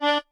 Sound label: marimba chainsaw; ゆめ2っき